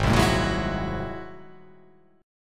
G sharp Augmented 9th G sharp

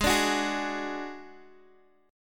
Gb Suspended 2nd Sharp 5th Ab

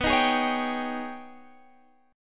G sharp Minor Major 11th B

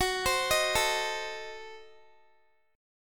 Gb Diminished 7th, down, Guitar; Gbdim7; arpeggiated

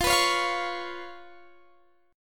C sharp 7th F, chord

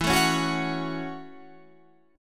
F sharp Minor E, Chord; strummed; JGuitar

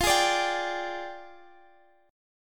F Minor 6th Add 9th F down Guitar Half Step Down

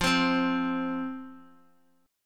F 5th F, down, Guitar; F5 strummed

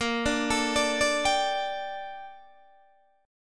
A sharp Major 13th, Guitar Open D

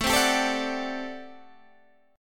A Minor 13th, Guitar, Standard; Am13 strummed; JGuitar

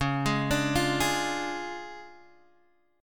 D Minor Major 7th Flat 5th C sharp, guitar

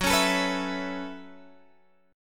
F sharp Minor 11th, Guitar, Open G

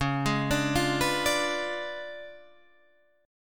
Db 7th Flat 9th Db down Guitar Standard; Db7b9 arpeggiated; JGuitar

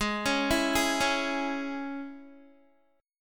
Ab Suspended 4th Sharp 5th, Guitar; 15,16,16,13,13,x; 4F,4A,5C,5G,5C,6E; arpeggiated; JGuitar

